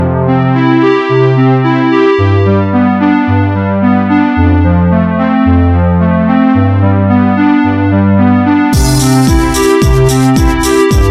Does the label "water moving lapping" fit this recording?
No